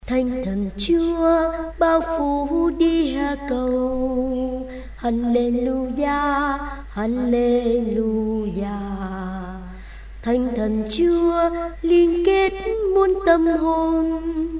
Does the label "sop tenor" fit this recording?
Yes